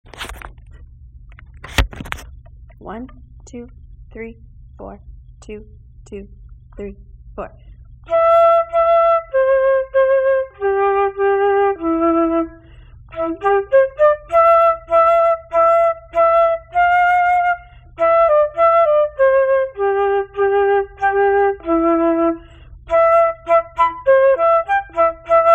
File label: english folk tune part 1